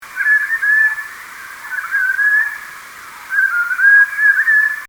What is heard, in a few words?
Alexanders Akalat; African birds